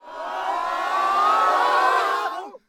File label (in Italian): Versi Folla